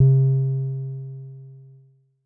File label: Bass SIN H, Yamaha DTXpress-II